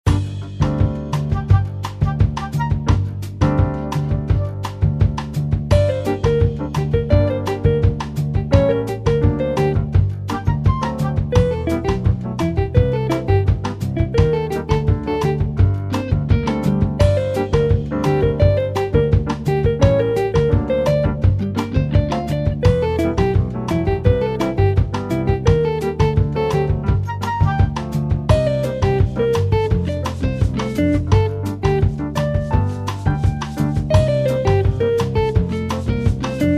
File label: Fm Jazzteam